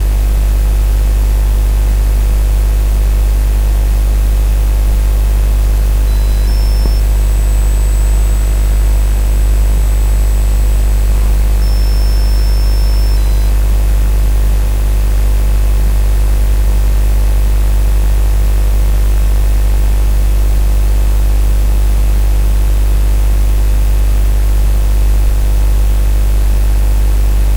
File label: Strange Beeps